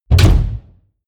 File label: slam, tueren